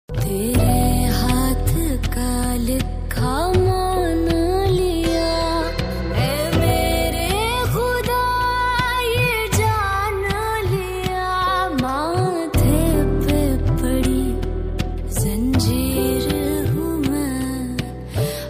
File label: Ost pakistani ringtone